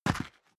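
step boots single concrete grit single stair down, footsteps; schritte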